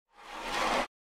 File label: Swish